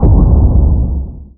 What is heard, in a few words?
far explode